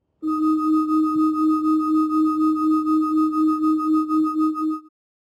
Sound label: pedestrian speaker